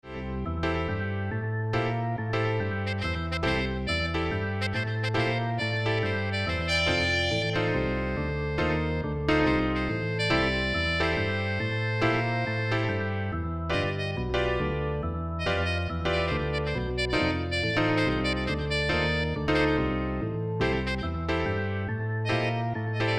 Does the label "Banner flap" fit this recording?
No